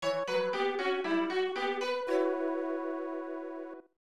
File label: Unused Introduction Jingle; Super Gomoku Shougi